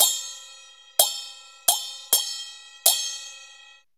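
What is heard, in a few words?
CHINA SM12 L, China cymbal; Spectrasonics - Heart of Asia CD2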